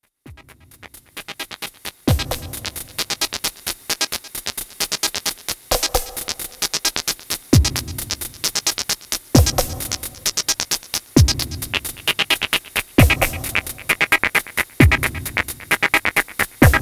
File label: Riffs; Umfang